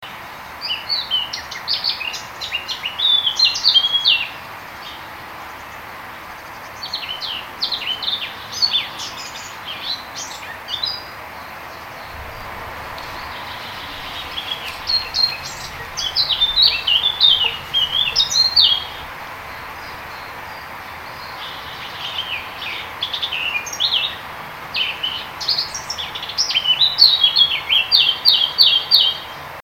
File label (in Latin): sylvia atricapilla; vogel; hecke; biologie